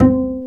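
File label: STR Cb Pizzicato, strings; Roland L-CD702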